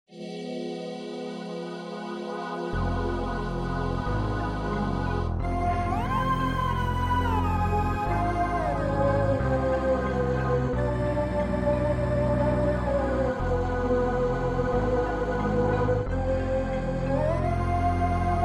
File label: Midi Scene; Shining Plume 2 Windows gamerip 2017